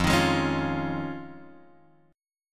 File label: F Minor 7th Sharp 5th, Guitar, Drop D